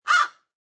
Crow Caw Single Short, A single, basic crow caw, crow call.; Bird Sound Effects; Animals; Lynne Music